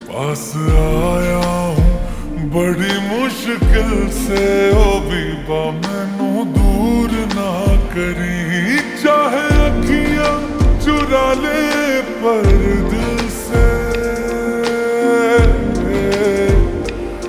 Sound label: Ringtone slowed and reverb